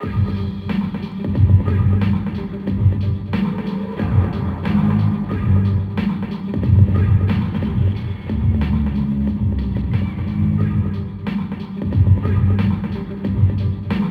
Radio Outside; Urban Radio 1

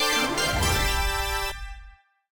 Levelup

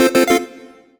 collect item chime

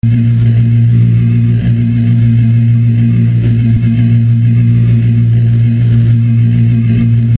Laser Loop; Super Sami Roll ps5 switch windows xbox one xbox series xs gamerip 2021

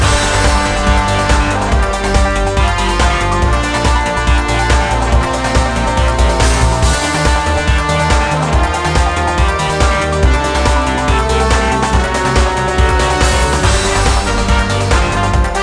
Cop Industrial; Emergency Heroes; wii

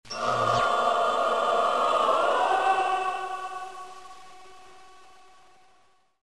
Magic; dragon lore 2

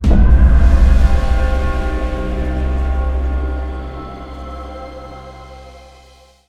Result Lose; the king of fighters survival city android

